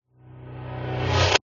sound design cinematic hit reversed build tension short crescendo